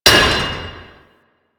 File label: Worker Hammer Impact Metal, weapons; Grn Worker Hammer